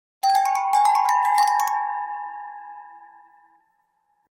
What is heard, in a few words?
ethereal suspense